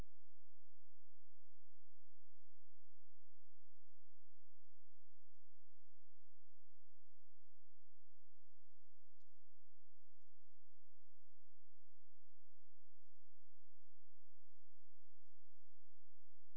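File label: hydrophone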